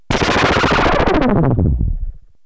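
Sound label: disintegrate, cartoon, space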